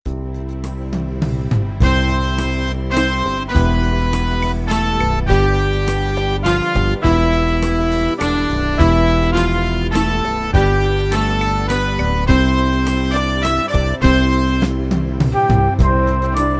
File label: beat